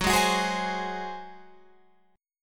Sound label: F sharp Suspended 2nd Flat 5th, Guitar, Open F; F#sus2b5 strummed; chord shape; 18,15,18,15,15,15